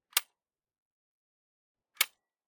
1928A1, Handling, Dry Fire, automatic submachine gun; Thompson; MKH416; Pole Position